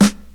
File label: damped steel snare drum sound g key